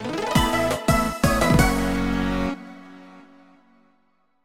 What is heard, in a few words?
WIN; vitamin connection switch gamerip 2020; subgame medicine ball